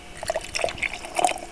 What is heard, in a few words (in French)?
Liquides